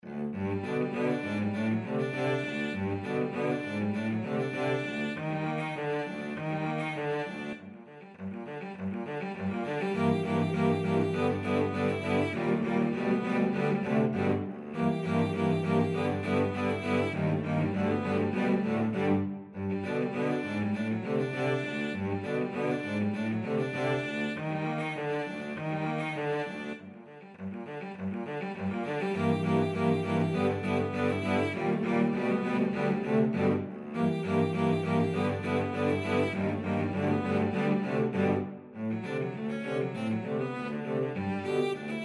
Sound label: cello quartet